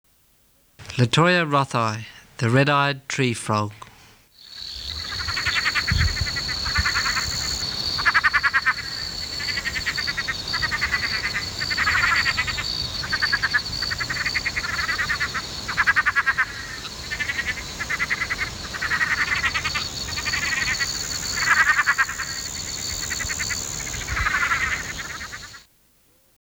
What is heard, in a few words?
Litoria rothii